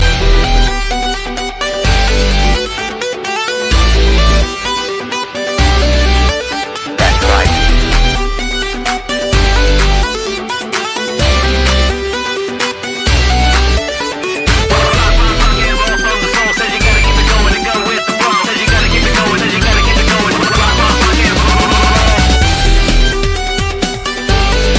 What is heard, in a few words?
Electrorock